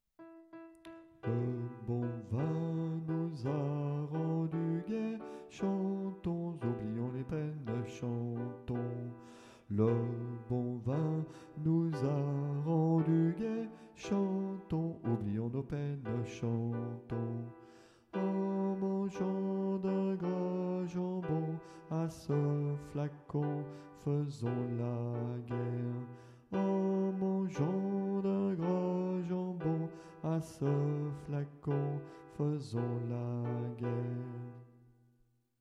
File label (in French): alto; le vin clairet ( alto)